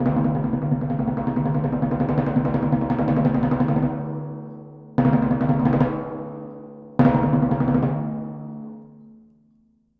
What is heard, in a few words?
Timpani, Orchestral, Rock Pop; Sonic Foundry (Sony Creative Software); Orchestral Series VOL-4 - Rock and Pop